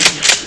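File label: shotgun pickup; gunslinger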